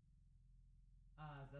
Piano Mono

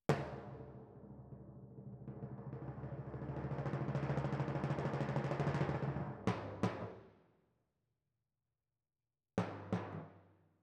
Timp Close

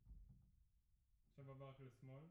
Piano Mono

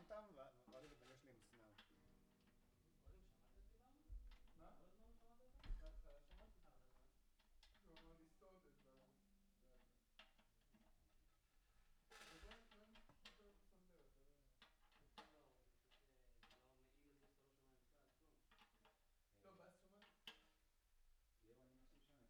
Room

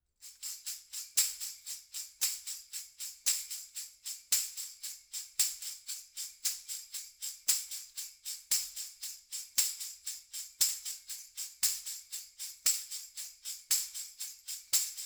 PERC OH M

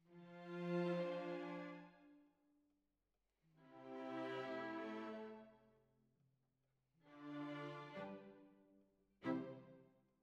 Violas Over

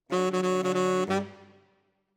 Tenor, RNR for piano

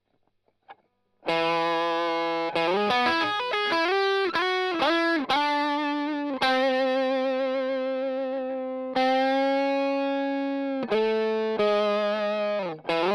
Strum Line

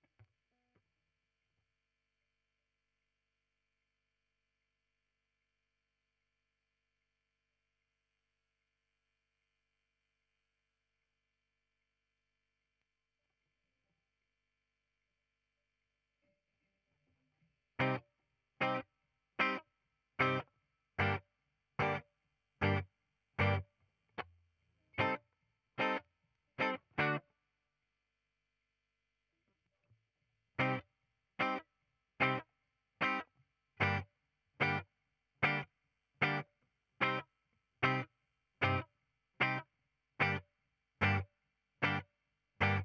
Gtr, Ragtime

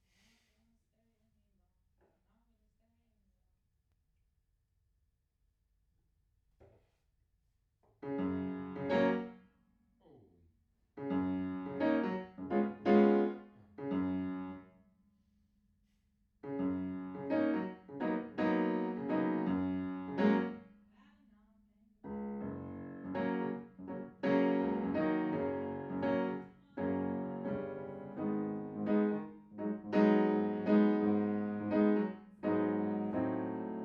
Piano Room

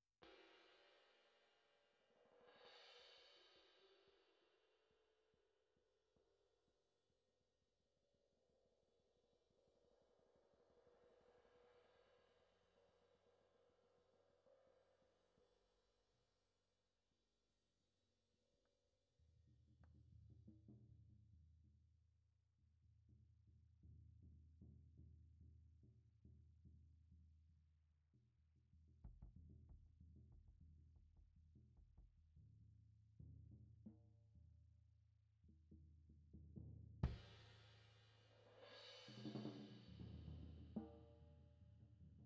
KIT

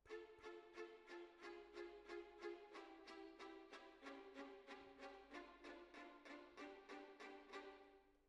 Decca, Jazz for piano

